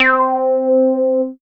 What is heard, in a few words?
MOOG BASS C4; Best Service Dream Experience